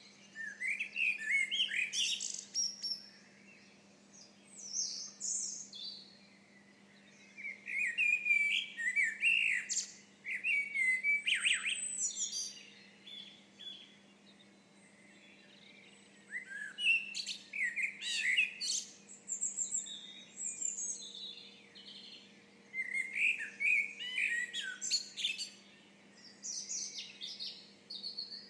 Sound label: early birds